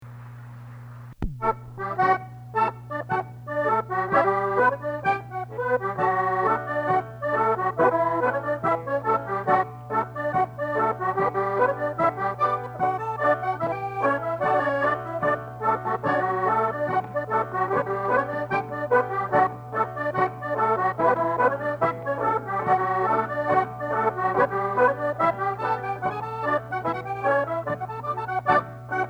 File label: hornpipe